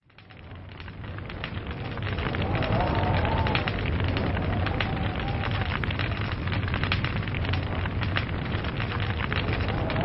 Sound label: Log Cabin Fire